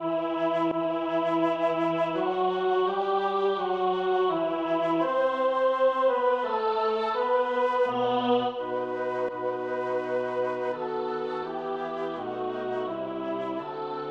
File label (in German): Deutsche Messe Sopr.; F.Schubert